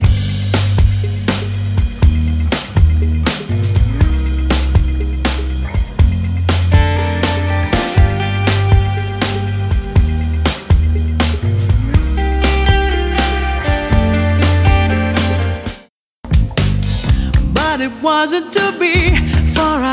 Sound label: bass guitar